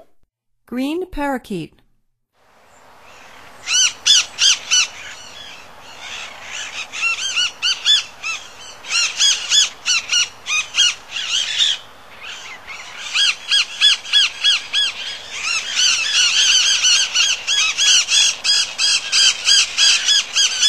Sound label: Green Parakeet; North America; Stokes